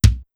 Kick, Drum Machines, Clavia DDrum AT, Pitched Up

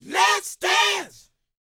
LET, Vocal, R&B Dance, DANCE 120

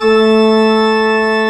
BP A3, ORGAN

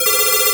SYNCLAVIER; DIGI2 FM+SMP

